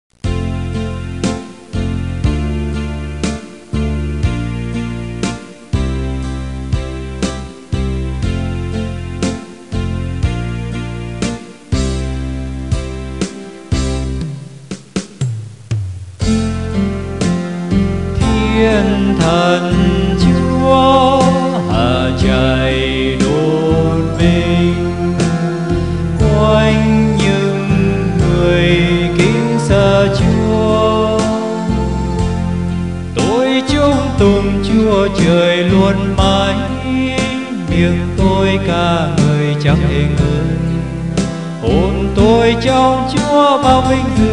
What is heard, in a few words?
BASS